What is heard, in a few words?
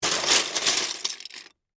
Legos Pick Up, Handful, From, Box, Plastic, Toys; Foley; BlastWave